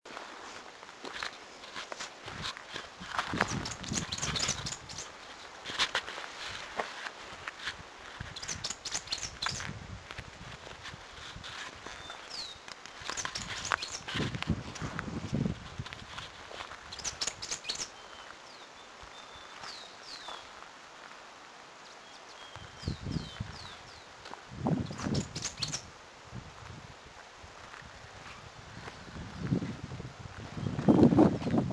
cinnamon warbling finch

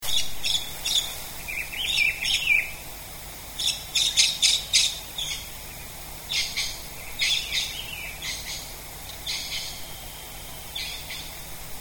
maroon bellied parakeet

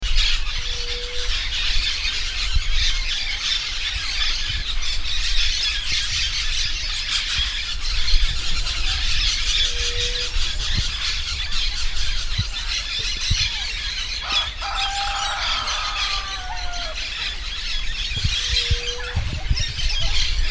canary winged parakeet

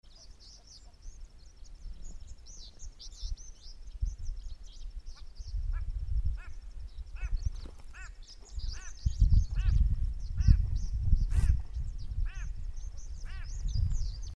abyssinian lark

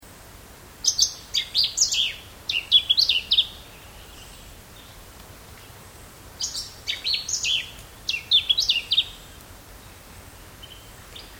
lemon bellied flyrobin

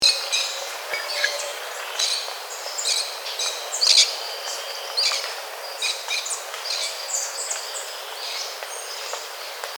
el oro parakeet

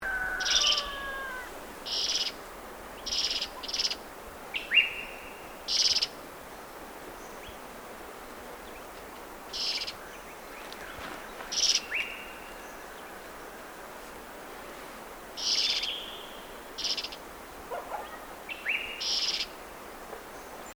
rufous headed robin

